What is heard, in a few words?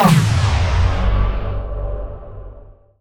hammer; weapons; hammer of purity; dota; omniknight